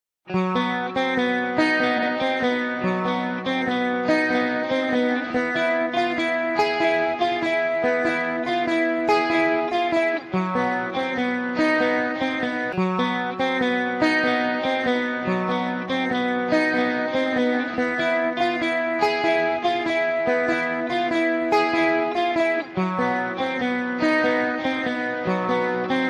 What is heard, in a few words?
guitar instrumental